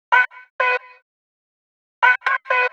126 AB VOCAL LOOP, Funky Underground; Loopmasters; Funky Underground Vol 2

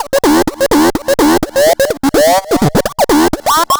126 C SYNTH ARP; funky; Loopmasters; Funky Underground Vol 2

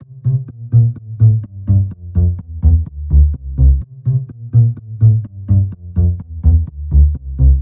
126 C BASS, Funky; Loopmasters; Funky Underground Vol 2; BASS LOOPS

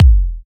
Bassdrum

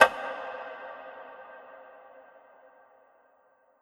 FX Impact

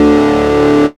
HARD D2; dubstep; Jack Sparrow; Deep Dubstep Progressions

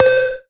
Perc, Dubstep One-Shot Drums

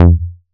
Bass Shot F

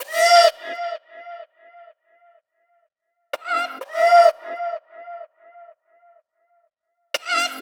126 F VOCAL LOOP, Funky Underground; Loopmasters; Funky Underground Vol 2

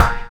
Perks; Drum Hits; Loopmasters; Jon Carter & Alex Blanco; Block Party Essentials

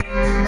G STAB, funky

